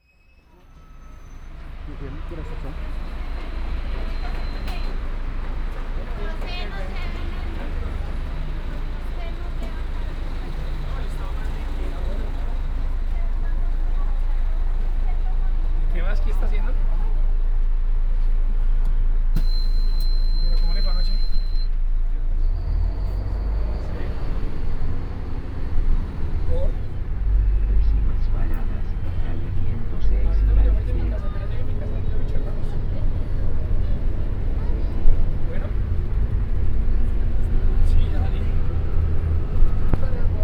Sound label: bogota transmilenio cycle stations